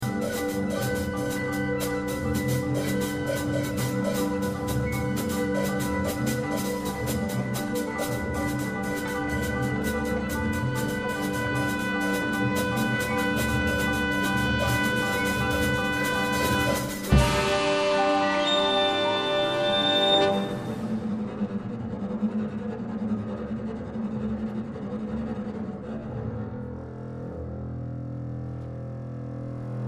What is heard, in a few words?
ContrabassConcerto